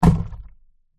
tub plastic large empty throw onto deep water inside tub perspective